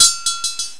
WHEEL

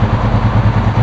Engines low

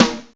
Drums; PCGamer50

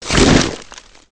sand hit; pcrazy; Bovine; Dash

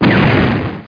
EXPL; PC Gamer IT CD 31; ARMRCMD

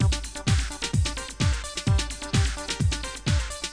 House mix

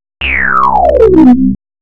sweep

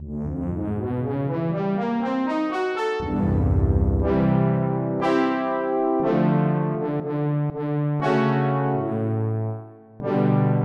Synth Brass2; FF7

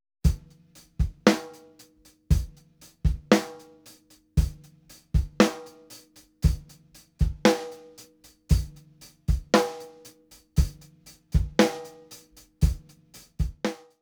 DRUMS

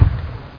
Flak

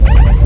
Phaser; Amiga Games 1996 #7; warstar

